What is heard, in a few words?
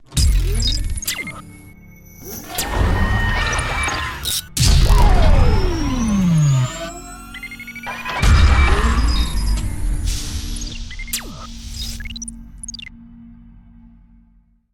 Great Event Futuristic Launching Gimmick intro; Video Montaj Design